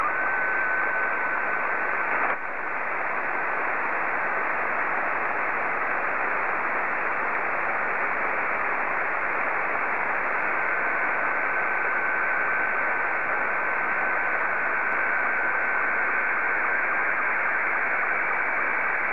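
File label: Scottie 1, slow-scan television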